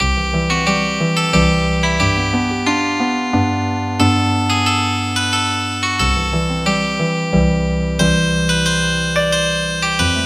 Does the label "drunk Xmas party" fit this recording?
No